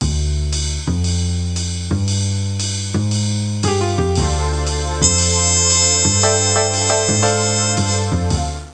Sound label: dance; My Science Fair